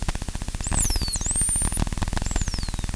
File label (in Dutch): tuimelaar; walvisstrandingen